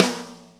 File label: New York Loop, Drum Tools, Laidback; Sonic Foundry (Sony Creative Software); NY Loops